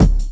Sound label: BD; Best Service; Dance Mega Drums